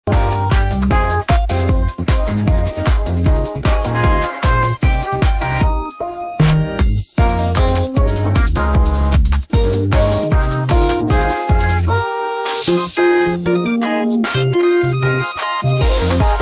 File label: jingle a game a week dot com; AI Test Kitchen